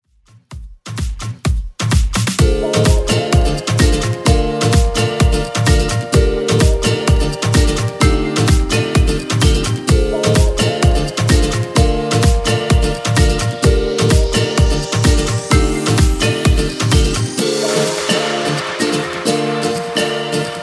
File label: Calle; Redrum